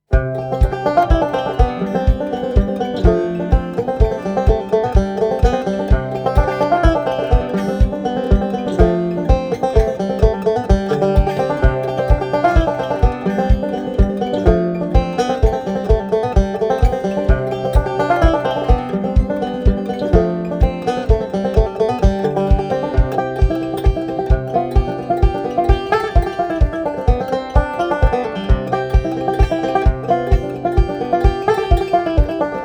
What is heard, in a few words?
polska, banjo; Pinnin